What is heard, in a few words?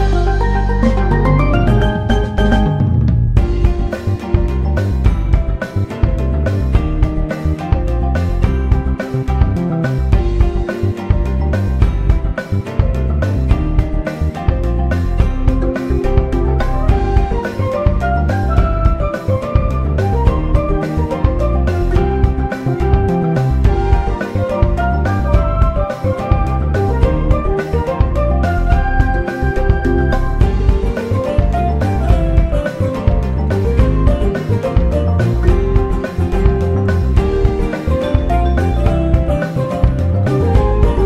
Cove (Muffled); Thwomp Cove; Super Mario and the Rainbow Stars